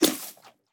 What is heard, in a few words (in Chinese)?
山羊进食声